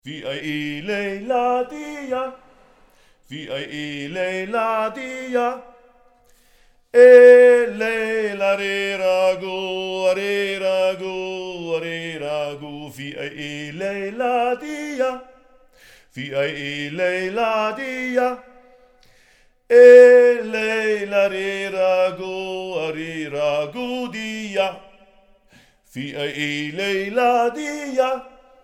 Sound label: voc solo arab; rehragout